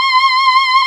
VOI FEMA, vocoders; Sound & Vision - Gigapack I CD 2

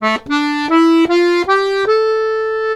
Accordions; USB Soundscan vol.40; AKAI

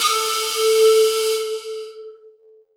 ethno, sound ethno; Ueberschall; Techno Trance Essentials

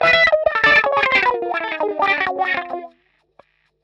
wah grind; PowerFX - Nu Jazz House

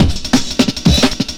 175, Breaks; EZRollers

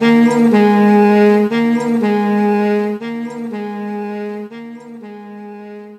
Sax Delay

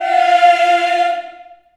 F4, Choir

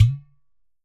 GHATAM

